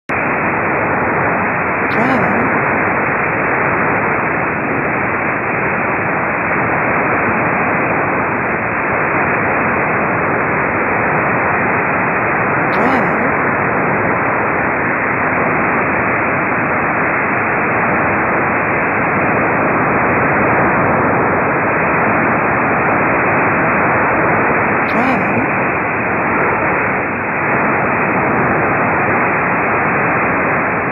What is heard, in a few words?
17630 kHz DRM; sbo; eth